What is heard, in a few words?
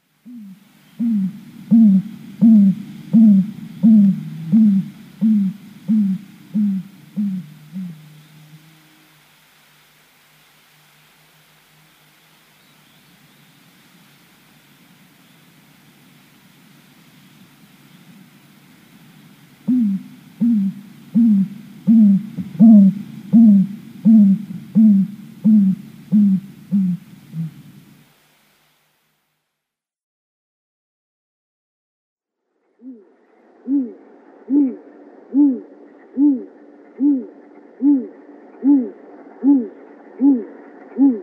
Great Grey Owl male display